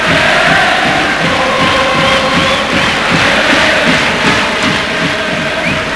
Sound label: Chant, Crowd; David Beckham Soccer; XBOX